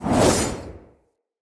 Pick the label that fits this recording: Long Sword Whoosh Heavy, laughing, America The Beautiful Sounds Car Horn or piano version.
Long Sword Whoosh Heavy